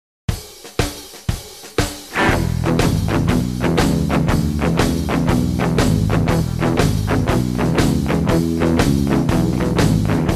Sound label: Rhythm Guitar